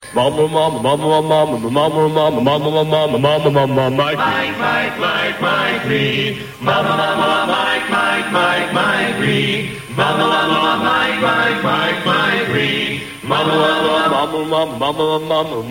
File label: jingle; mike read